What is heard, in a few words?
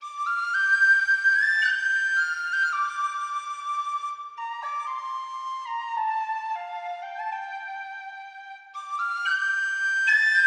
tin whistle calm track; whistles; versilian studios